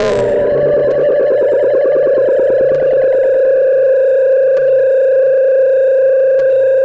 TECHNO EXTRA 140

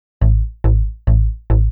TECHNO BASS 140 A

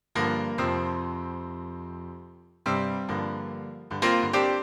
PIANO 96 G; old school

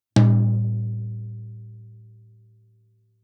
Toms; Touch Loops; CosmicFunk

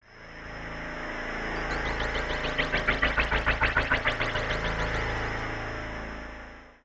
SPACE SHIPS; SpaceSounds

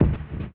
One Shot, Hits

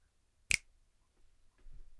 Snares & Claps, One Shots

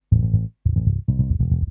TECHNO BASS 140 A

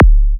Minimal Kick, Drums, Kicks

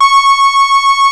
SOFT HI C5; OBMX-SYNTHS

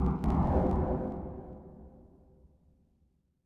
FX Industrial 125

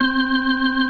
HAM FAST C4

